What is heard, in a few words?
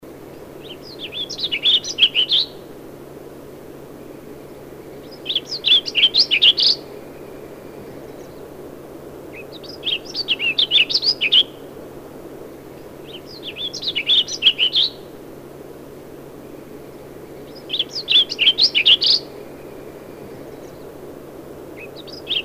Warbling Vireo; Vireos